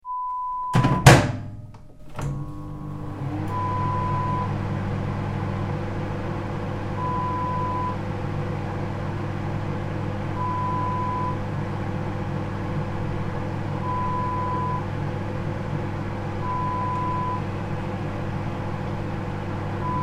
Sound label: Microwave Oven